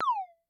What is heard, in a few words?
Multi, Vermona DRM1-MK2